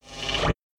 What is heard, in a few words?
Teleport Spacey